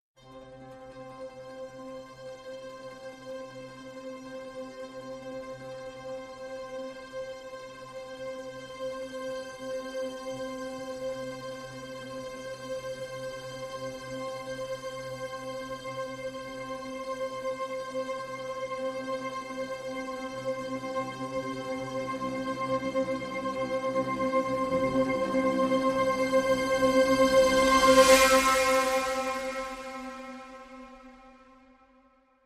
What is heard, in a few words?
Suspended Trems (C)